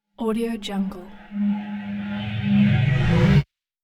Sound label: short reverse with no tail; hollywoodedge